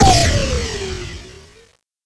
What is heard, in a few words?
Miradorn Torpedo